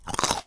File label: Crunch, cartoon Foley Fx; musicradar; cartoon caper